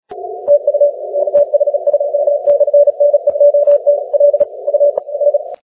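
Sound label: 17m continuous wave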